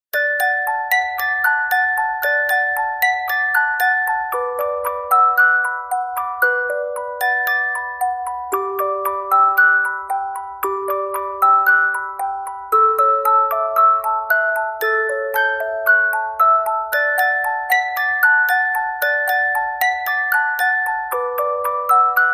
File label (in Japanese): オルゴール; 呼び込み君